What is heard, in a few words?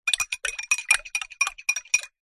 food drink metal spoon stir water in drinking glass short